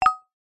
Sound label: multimedia game sound vibraphone glassy style mallet plink generic